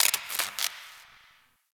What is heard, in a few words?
Derelict Spark One Off; Interior; Ambience; Warframe; Orokin Derelict